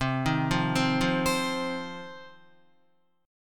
C Minor Double Flat 5th C down Guitar 4F 4A 5C 5G 5C 6E; Cmbb5 arpeggiated; JGuitar